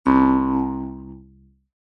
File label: cartoon spring boing jump jaw harp long low pitched